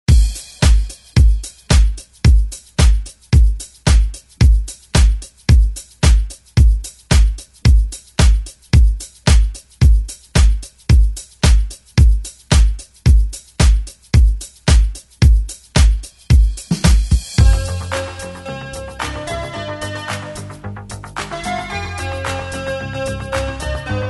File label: 80s ReDrum